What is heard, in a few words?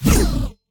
swing; smite; poseidon